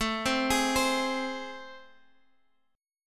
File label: A Minor Major 7th, down, Guitar, Half Step Down